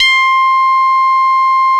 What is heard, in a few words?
700 B-SAW C 3, Korg; Back In Time Records; Korg Universe VOL-1